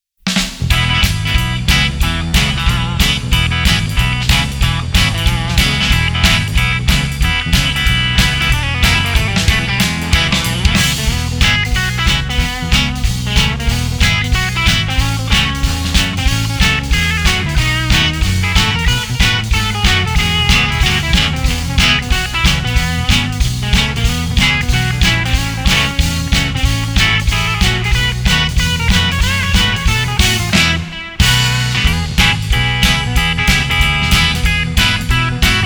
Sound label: Jingle Bells Drum